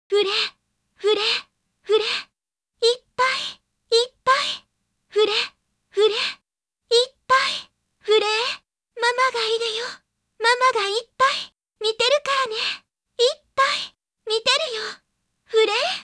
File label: cheer loop